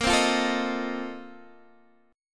A sharp Major 7th Suspended 2nd Suspended 4th A sharp Guitar Drop D